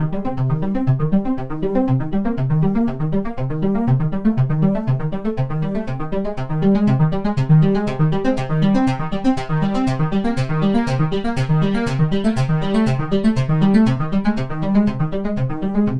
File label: Strings Arpeggio; Dr. Fink's Funk Factory